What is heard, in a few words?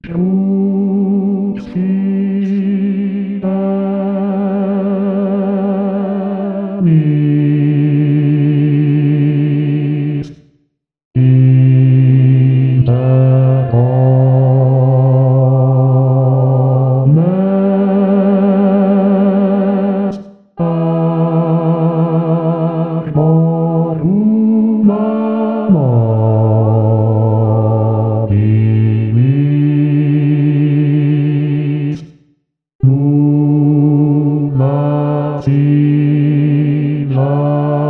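Crux Fidelis Bass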